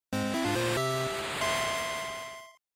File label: GB Racing Clear